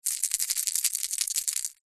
foley gemstones several small opal amethyst jade shake in hand